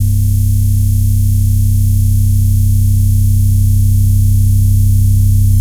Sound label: Drone Static; Drums & Drones